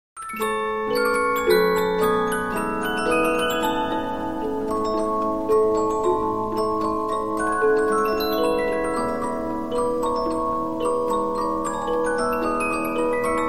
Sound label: Polyphon, 15inch disc musicbox